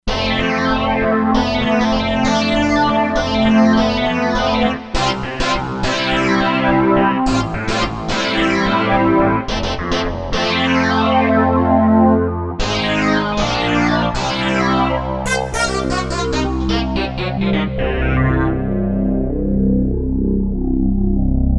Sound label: Wave Brass, Korg MSC-07S Synth